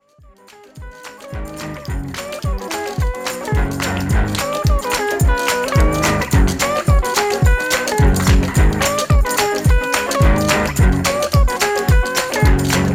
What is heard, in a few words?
instrumental